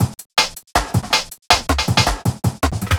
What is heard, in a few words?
Break, Drum N Bass Percussion